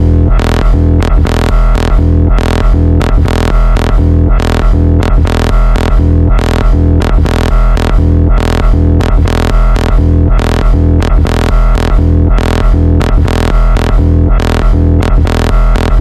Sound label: Electrical Storm; Immediate Music